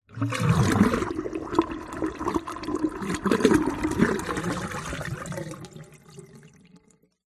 household kitchen sink metal plug pull water drain gurgle